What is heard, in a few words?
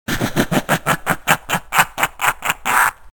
Laugh; Skins; Sprite Swaps